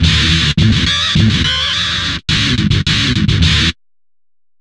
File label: GTR, Chemical Beats